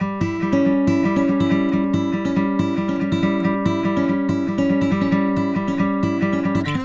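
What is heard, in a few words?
Country Guitar, Pre Chorus; Digidesign; Pro Tools Essential Loops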